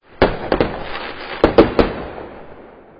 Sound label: fire